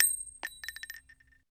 shell, g2contender; weapons

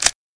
model500 slide back; weapons

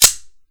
slide release, sig p228

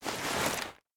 famas cloth, tavor

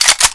galil bolt pull; weapons

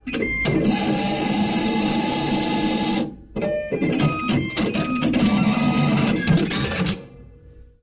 atm spit out

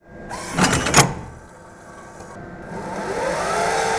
atm eat cash

